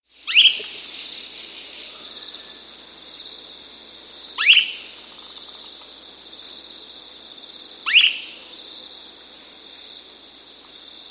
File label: Ash-Throated Gnateater; S. America